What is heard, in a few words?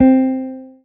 marimba guitar; ゆめ2っき